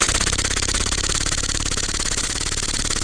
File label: PROJECTOR